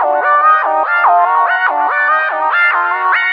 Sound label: arp D 5; SpecOps2MPlayerDemo; Music